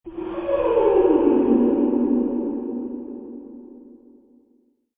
Female Ghost Laugh, Horror - Eerie, spooky, ghostly female voice, laughing; laughing, chuckle; Ghosts